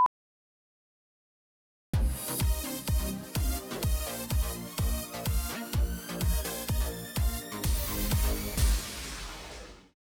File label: Drama Music; SuiteSpot; RnB Divas LA S2